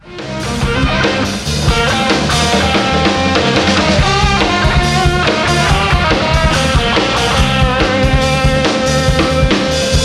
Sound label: instrumental live